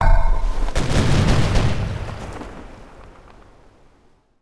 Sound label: Build Frigate; Civ4 warlords